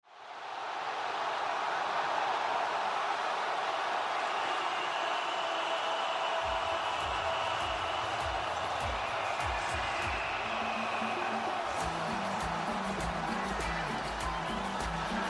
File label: crowd ambient